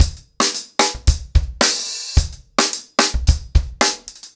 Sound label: 110BPM, ACOUSTIC DRUMS